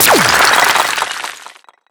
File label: SFX Earth; Skylander